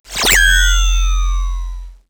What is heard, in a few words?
D+B